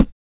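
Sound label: vox percussion, Vocal Planet Jazz FX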